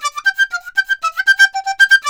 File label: DIZU 120-C; INIS; Opium